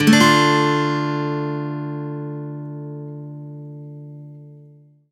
D min downstroke, Acoustic Guitar; Sonic Foundry (Sony Creative Software); Songwriter Acoustic Guitar Companion; One Shot Chords

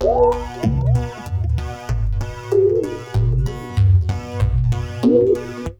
Synth Pulse + Tabla C 120; Trance Formation

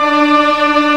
Organ, Big Barton Pipes

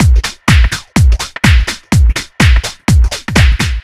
125 Full, Drum Loops 125bpm; Zero-G; Soundclash Nu Dance Electro; Acidized Wavs; Tough Jam

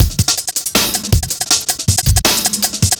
Beat, Drum N Bass

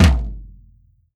drum, mixed drums, World Instruments; Best Service ProSamples vol.52 - World Instruments 2; AKAI

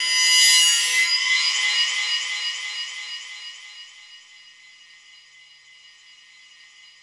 OIL CAN BO, BOWED METALS; Spectrasonic Distorted Reality 1

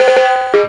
INS TIMB RL, TIMBALS, Ragga & Dub Reggae

